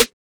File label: Open Snares